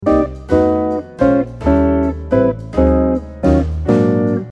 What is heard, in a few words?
four on six accents, jazz guitar